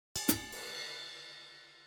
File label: 122 BPM Hats Hi Hat; Spectrum; percussion essentials 1